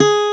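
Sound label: Steel Acoustic Guitar B2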